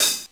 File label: DP50 Ohh, Technics PCM DP50